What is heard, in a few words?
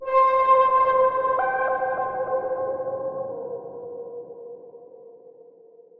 LEAD (C); One Shots; Rewards; Cymatics; Mystery Pack Vol 4